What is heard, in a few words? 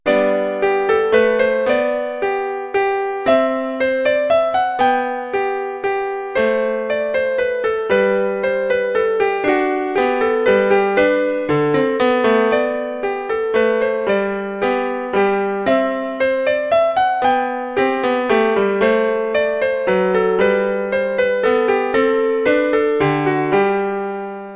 piano